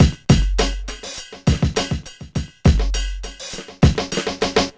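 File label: 102 bpm, Funky loops; Zero-G; Funky Elements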